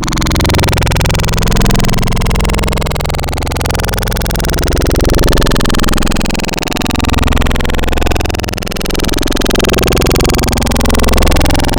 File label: throat rumble; Synthetic's Serum Collection; Cymatics - Growl Tables Vol 2